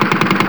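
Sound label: machine gun; PC Gamer IT CD 48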